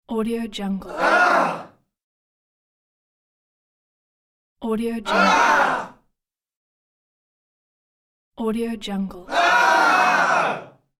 short disappointed awws from men audience

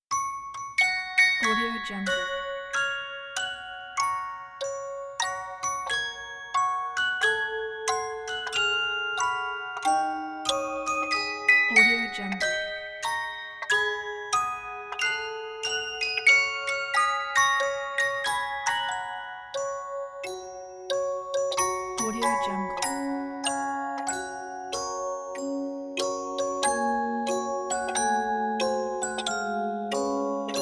music box

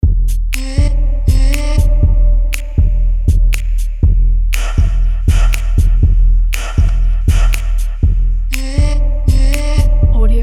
urban fashion

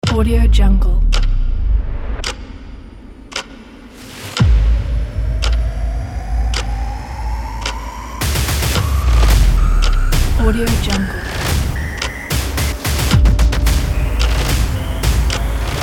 horror trailer

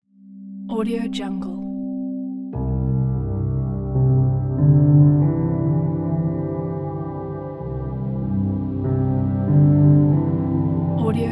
sad ambient piano; sjsound

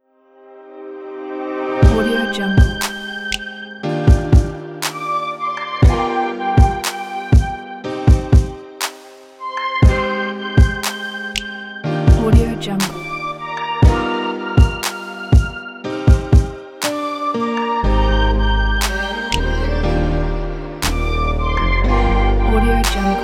vintage synth chill pop

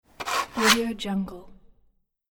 canceling document

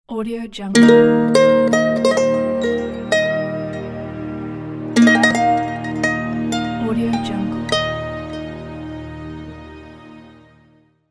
chinese logo

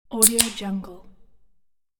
can open

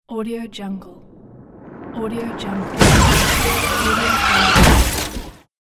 car crash

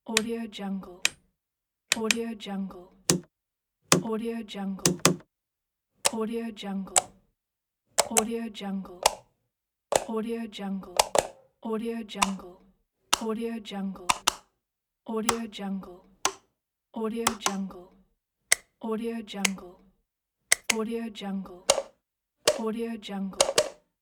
light switch on off

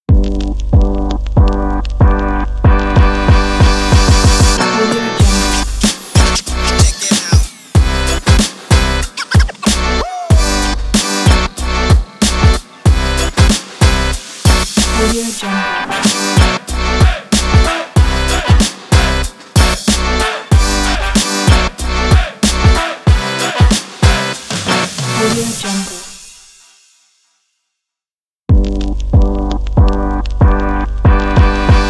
on this hiphop

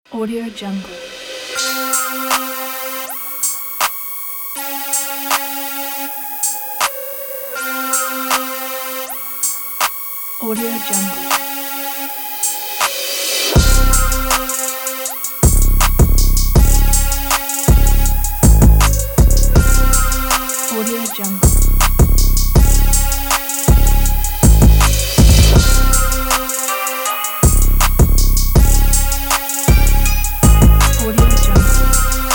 agressive dark crime trap hiphop

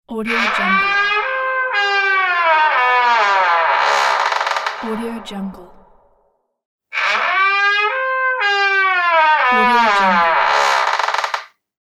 squeaky door

